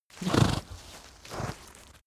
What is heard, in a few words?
horse blow double